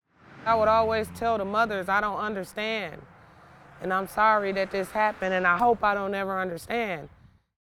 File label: traffic; Wisconsin Life; Peace Garden